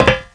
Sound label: grate, player